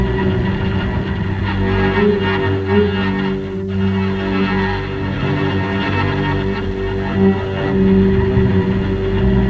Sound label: Ambience Sinister Electric Cello Loop; little robot sound factory